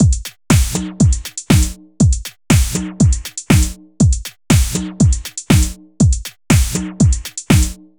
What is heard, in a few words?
120 trance beat, Drum & Perc Loops